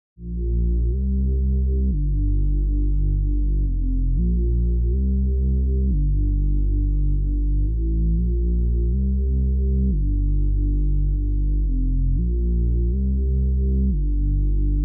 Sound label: 120 BPM A# Maj Bass; Cymatics; Miami Guitar Pack - Pt 1; Frail